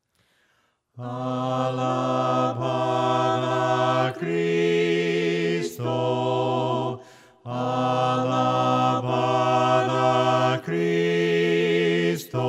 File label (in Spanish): cantando sin instrumentos; Alabada Cristo